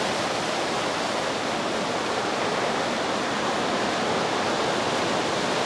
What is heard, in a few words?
swamp wind; ambient; outdoors